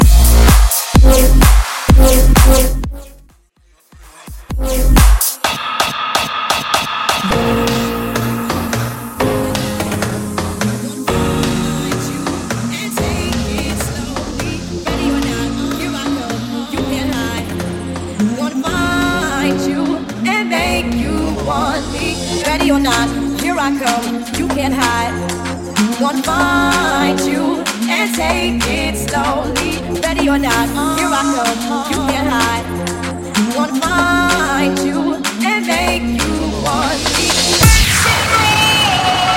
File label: 90s Bass House Party Mix; Mainstream VIP; Vegas Edit; 128 Bpm